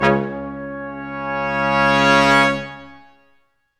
BRS QUIN S, Quintet sfz; Roland L-CDX-03

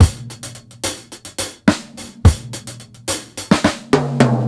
beat, Roots Funk; USB Soundscan vol.41; AKAI

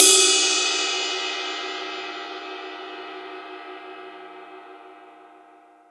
RIDE FLAT, Real Mega Drums

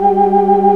Organ, Barton Tibias

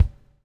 Bubble; Spectrasonics - Backbeat

